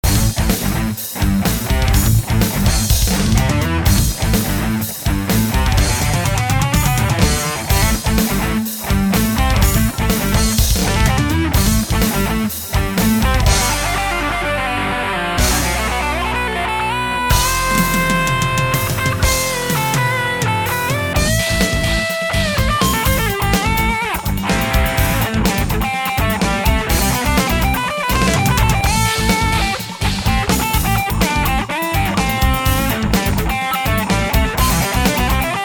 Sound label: solo